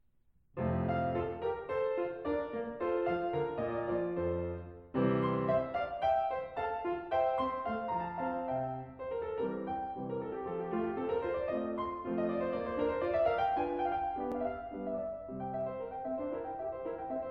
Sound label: Italian Concerto Allegro maestoso; BWV 971